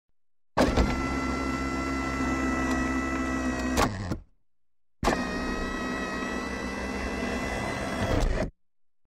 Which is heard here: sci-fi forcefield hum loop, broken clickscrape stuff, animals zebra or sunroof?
sunroof